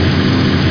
truck run; SPECOPS

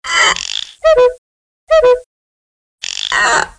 CUCKOO

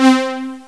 brass; Descent

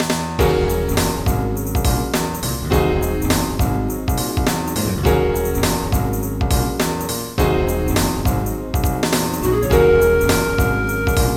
Groove; Multimedia & Business Audio Titles; GERMAN

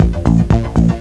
bar, Music; Swoop 1.0.2

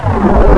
Special deactivate; Driveby B; MacAddict; HAVOC Demo

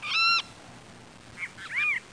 Sound seagulls

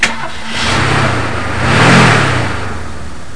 Car; dewars

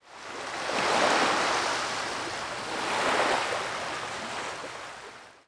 WAVE, water

